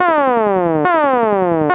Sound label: ALARM, SCI FI ALARMS; Ambient; Zero-G; Ambient 1